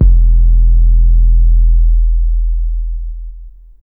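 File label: 808 rap kick; Drums In Your FACE!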